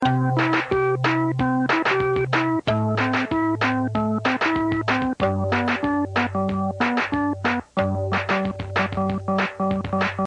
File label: Keyboard cat